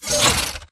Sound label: Mvmt Servo Light Short; AI Zombie Stalker; Titanfall 2